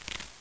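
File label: page flick; Santa's Workshop - Advent Calendar for Computers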